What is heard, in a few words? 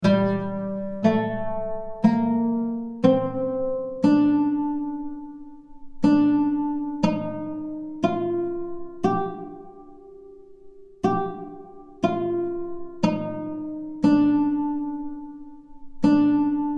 ud nihavend